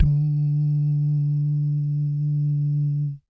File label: BS DUMMP; Spectrasonics; Vocal Planet; Jazz FX